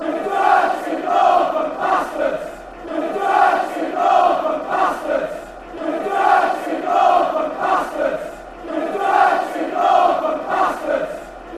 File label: dirty northern bstards chant